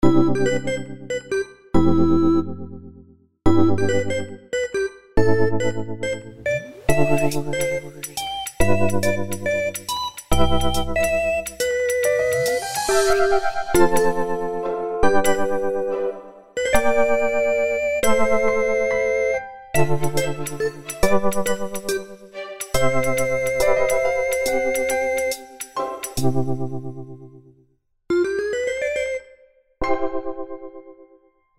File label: bgm; music; xiaoyouxi